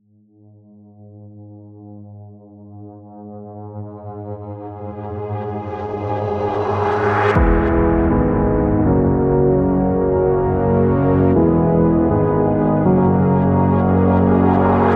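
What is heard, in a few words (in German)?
Biker film music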